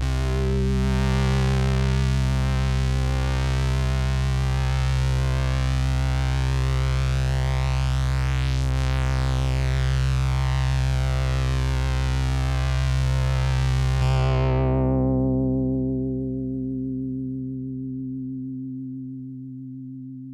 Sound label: SYNTH PWM FM Rich